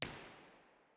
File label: typing room; terrys; surround 5 0